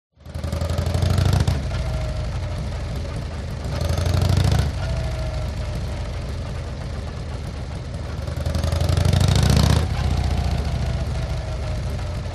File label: TRANSPORTATION MOTORCYCLE Harley Accelerate Triple Short